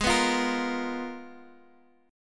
Ab Minor 6th Add 9th, Guitar, Half Step Down

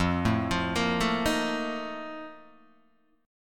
F 11th, Guitar, DADGAD; 3,0,3,3,0,1

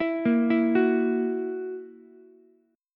E Suspended 2nd Flat 5th E down Ukulele; arpeggiated; JGuitar

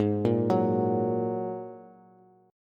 Ab 7th Suspended 2nd, down, Bass; Ab7sus2; arpeggiated; chordshape; JGuitar

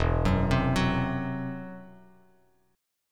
E Suspended 2nd Sharp 5th E down Bass Standard

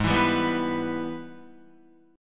Ab Minor Major 7th Sharp 5th, down, Guitar; strummed; JGuitar

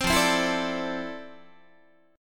F Major 13th Guitar; strummed

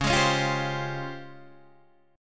C sharp Major Sharp 11th, Guitar Standard; strummed; capo=8

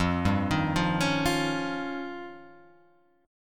F 7th Suspended 2nd Sharp 5th

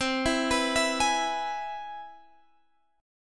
C Augmented Major 7th, Guitar, Drop D

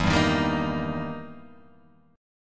E Minor Major 7th Flat 5th, E, down

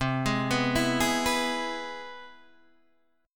C Augmented Major 7th, Guitar, DADGAD

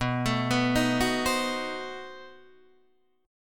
B 7th Flat 9th B Guitar Open D

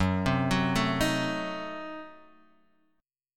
F sharp Diminished 7th F sharp Guitar Open D

